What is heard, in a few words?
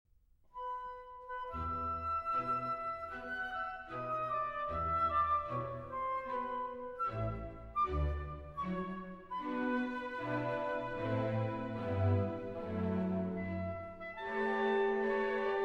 Piano Concerto in C Major, Tema con Variazioni; Prokofiev; Piano Concerto No. 3; Op. 26 II.